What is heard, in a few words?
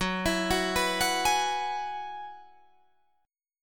F sharp 9th Suspended 4th F sharp Guitar Drop D